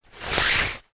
flag handling short movement pull through hand; foley; zapsplat

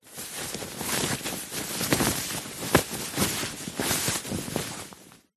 clothing shirt movement rustle; zapsplat

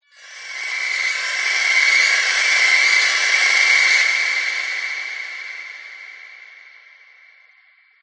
transition passby

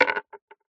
food sweet candy boiled set down on wood surface

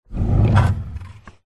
household drawer wooden pine close; zapsplat

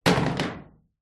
impacts metal brick hit metal joist

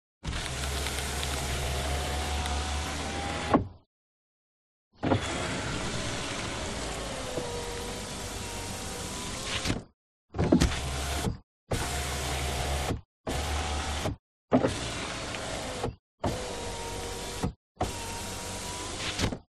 AUTOMOBILE S-TYPE Jaguar window; 2000; fox audio

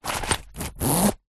purse pouch leather zip; zapsplat; foley

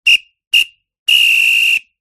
whistle final soccer; sport

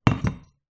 household stone bung jar down on hard floor; sharpe brothers

